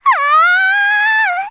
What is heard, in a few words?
attack; Eternal Return; Tia; Voice Line; KOR